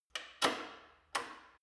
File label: gym bicycle saddle adjust lock soft, fitnessstudio